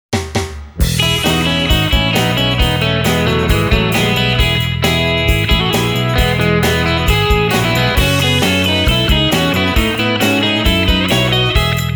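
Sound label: tokai tst 50 relic, guitar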